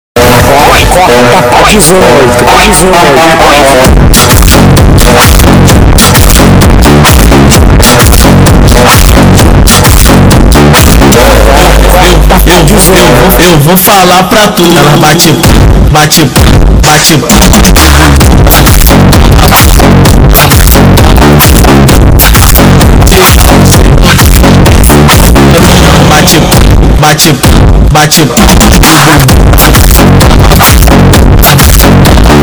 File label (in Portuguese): funk remix; Funk graves